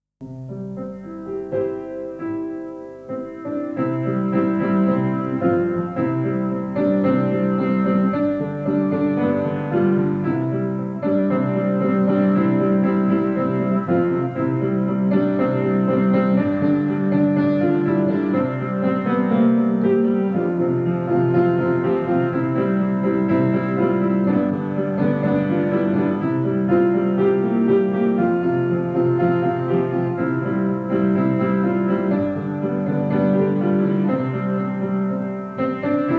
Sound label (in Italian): pianoforte solo musica; Cantici solo Pianoforte